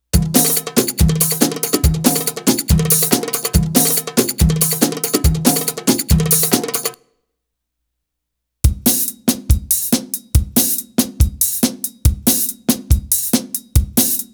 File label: Arabic Rhythms